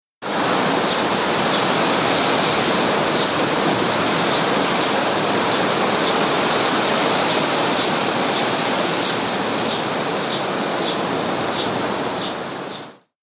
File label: Birds Wind And Trees; Bird Sound Effects; Outside Ambience